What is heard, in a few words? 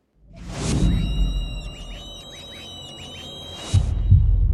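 Horror Scream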